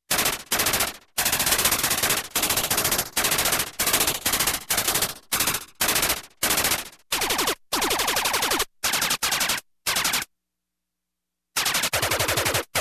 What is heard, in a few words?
Electronic gatling shots